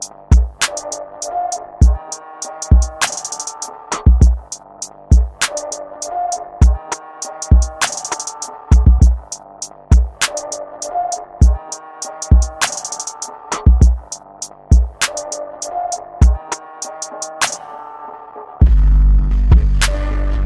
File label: new english edm ringtone; english ringtones